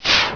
swoosh; Uprising

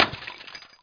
MIRROR, Prangs; PC Action 1998 December

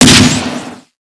grenade launch Fire, weapons; Spearhead Demo; MacFormat 2003 June

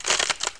Chop; Bible Fun Pack; NOAH

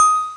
shoot bell

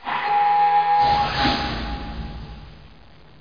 amb machine

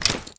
grenade forward, weapons foley; Spearhead Demo

